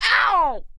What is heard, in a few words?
pain